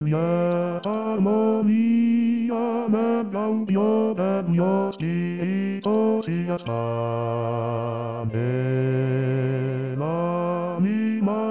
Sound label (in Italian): Bassi; Lieta Armonia